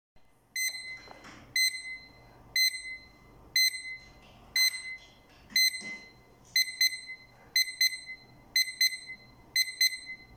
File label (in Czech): ukazka zvuku alarmu